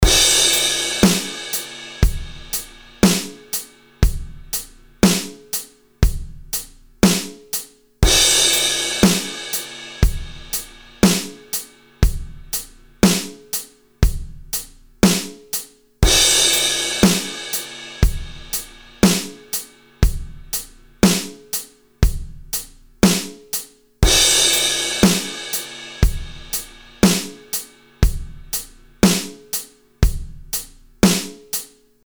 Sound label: drums; slatedigital; fg-stress; andysneap